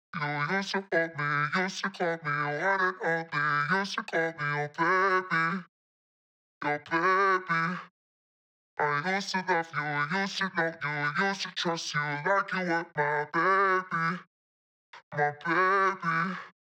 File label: Tropical House Vocals B Minor Low DRY